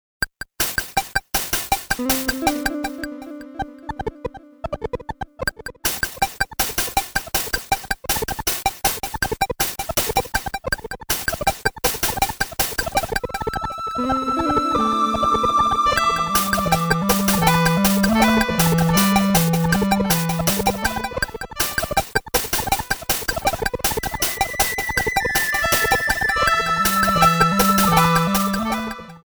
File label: casiotone